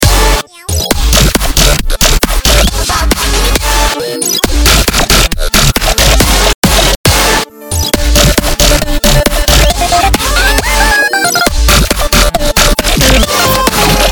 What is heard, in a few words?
dubstep track